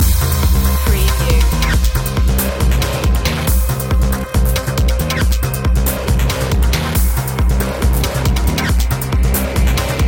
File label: thunder cat loop